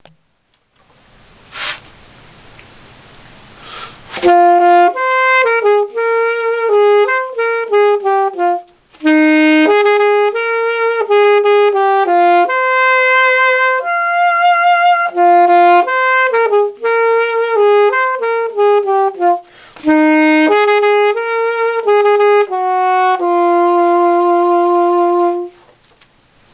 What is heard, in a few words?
Sax line